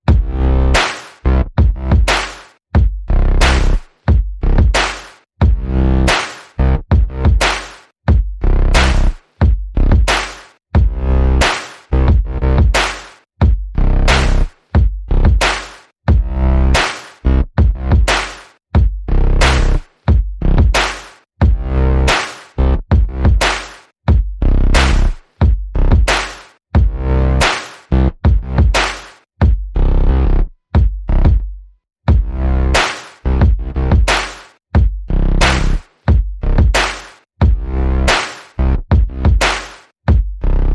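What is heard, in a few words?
Bass and Percussion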